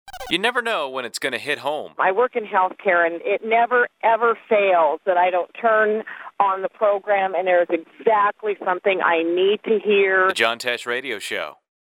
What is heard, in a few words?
Sweep Hit